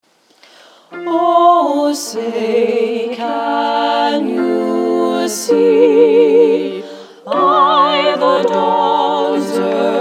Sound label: a cappella national anthem